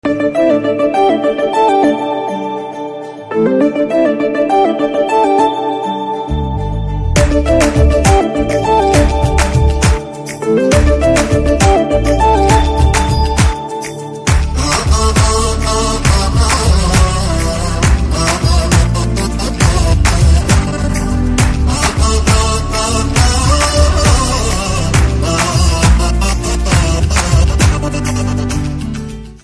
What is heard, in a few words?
BGM Ringtone